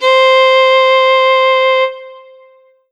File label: Roland SC 88 Violin C5